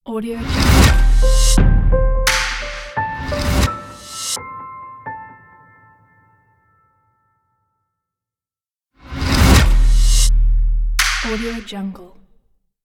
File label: introduction ambient logo; centralperkproduction